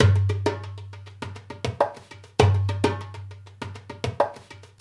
Dumbek; Essential Percussion

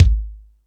Hip House, drum machine

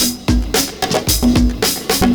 PERCS, 70 s Breakbeats; USB Soundscan vol.46; AKAI

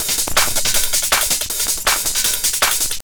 JUNGL 160, JUNGLE LOOP

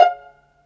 STR PIZ VLN, String Sections, Violins Pizz; Roland LCDP13

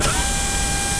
AIR WRENCH; InVision Interactive; The Piano

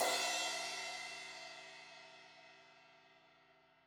Crash 16in edge, Cymbals; Deeply Sampled Drums; Cymbals Stereo

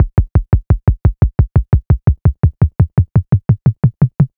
Clicky Dry; Mbase11

